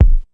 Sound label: Kick, Ensoniq ASR-X Pro